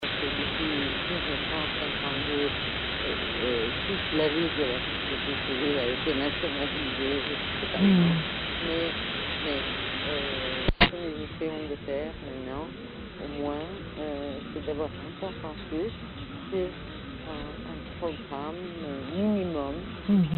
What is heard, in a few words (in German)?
Langwelle 253khz; Algerien